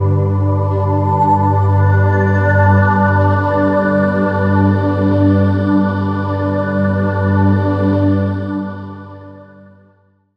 Rise Glass Pad; Zero-G Jungle Warfare 1, 2 & 3; 90 s Sample CDs

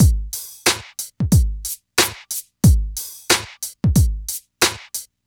DRM, Hip Hop and R&B Drumloops; Best Service ProSamples vol.01; AKAI

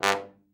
trombone; Best Service; Killer Horns

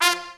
SECT BRASS FX

